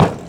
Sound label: Bleachers Perc, percussion; HOUSTON; Moody Park